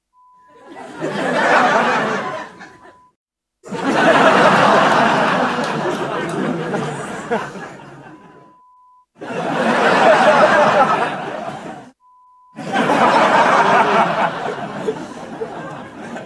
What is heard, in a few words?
Laughter Collection